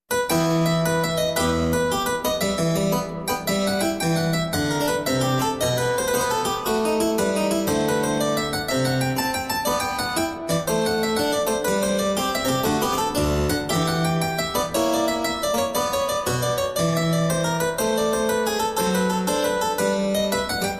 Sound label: La Molesina Gigue, Cembalo Version